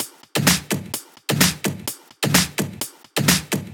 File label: Dirty Dutch Loop; Mutekki Media; Vengeance Electroshock Vol.2